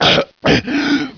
gasp

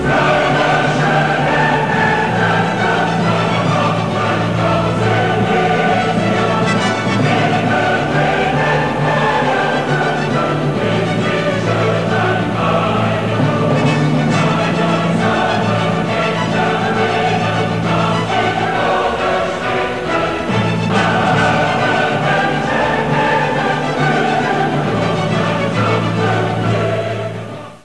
symphonie

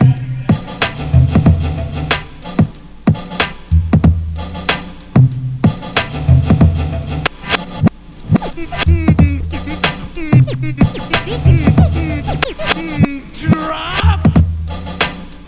club beat; de leestore

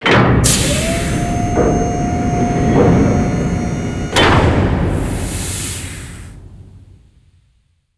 door vault; de code destroy

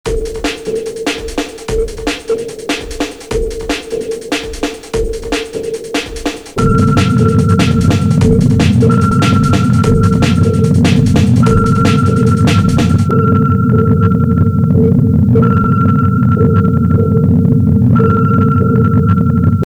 sub

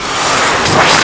close; de stargate atlantis

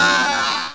teleport

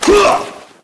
fists draw, weapons; deluge

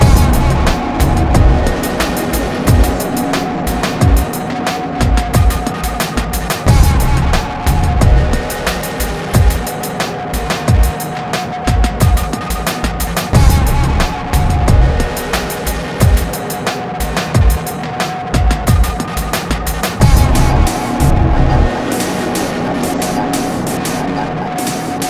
bossfight loop; music; morass beta

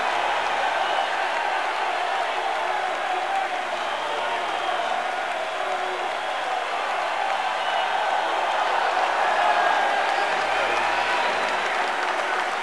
cheer low

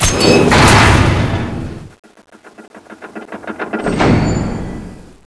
floor trap; dm clocktower

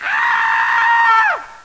scream

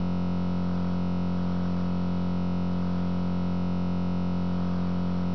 Twn electr

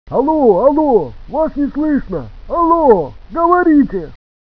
phone

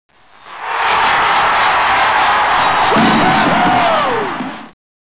knife cheer

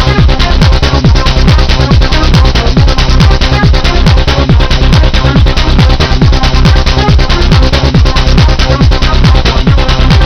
techno; fy laserquest

